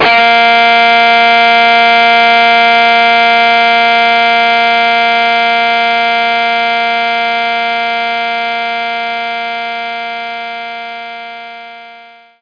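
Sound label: electric guitar Bb2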